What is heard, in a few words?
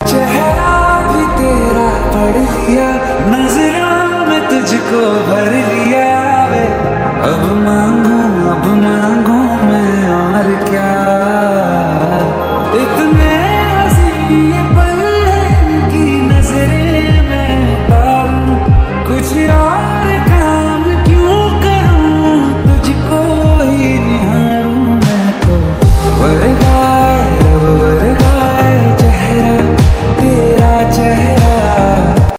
lofi hindi ringtone